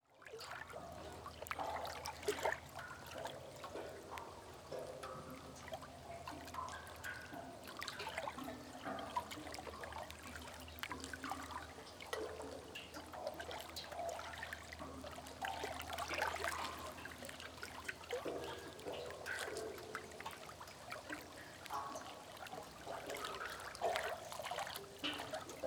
Ambience Water And Sewer; AdobeCC

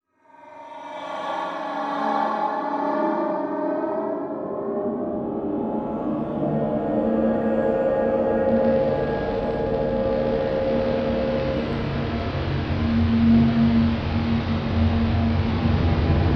Ambient Distortion; AdobeCC; Imaging Elements; Module Production Element Title Imaging

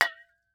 Industry Tool Aerosol Can Hit Cement

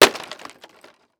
Impact Wood Crash Mic On Floor

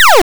Hero Bullet; Audition

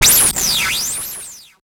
Title Transition Communication Distortion Jammer

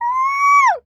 Animal Mammal Carnivore Cat Puma Scream; animals; AdobeCC

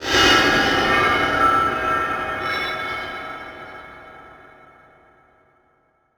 Transition Metallic Drone; Production Element; Title Transition; AdobeCC

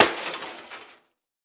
Crash Aluminum Baseball Bat Striking DVD Player; crashes; AdobeCC

